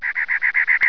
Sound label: WREN; 鸟; animal